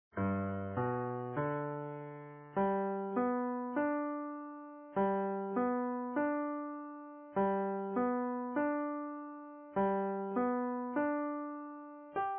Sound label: g triads, jazz guitar